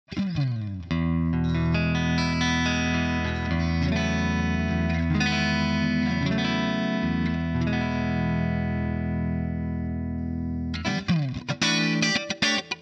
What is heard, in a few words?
Comp Clean